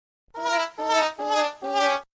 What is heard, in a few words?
uh ow trumpets